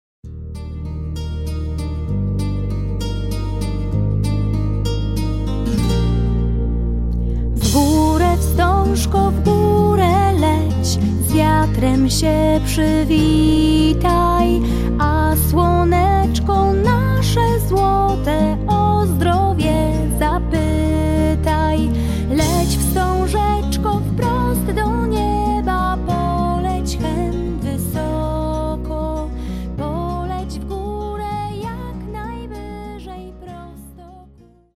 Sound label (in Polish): voc fragment